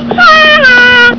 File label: haha